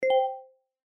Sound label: subscription one